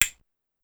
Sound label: Woodblock, Drum Machines, Clavia DDrum AT, Pitched Up; 300 Drum Machines